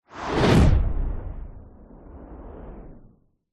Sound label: fantasy magic spell whoosh ball of energy pass by fast